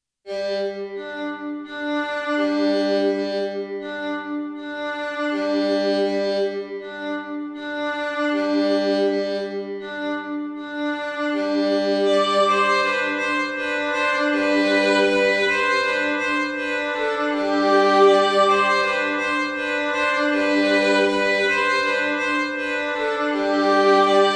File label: Violin Fun